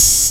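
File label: HH open hi-hat, Fresh Disco House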